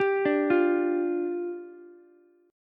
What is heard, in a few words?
D Minor Double Flat 5th, down, Ukulele; Dmbb5; arpeggiated; JGuitar